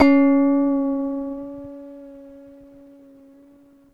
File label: ASI KOTO C#3, Yamaha VP-1, ASIA-KOTO; Music Store; 90's Sample CDs